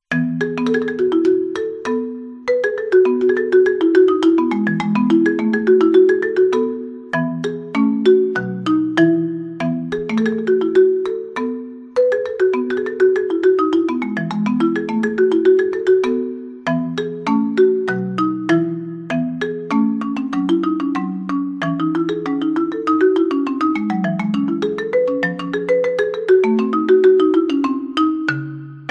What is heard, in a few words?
Partita BWV 1006 Gavotte, Mallets; Bach for Mallets